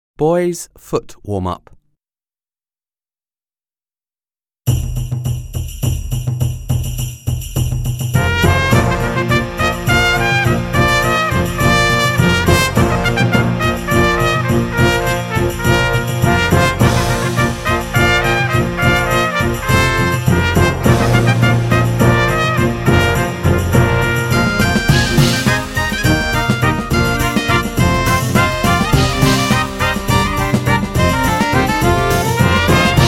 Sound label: Boys Foot Warm Up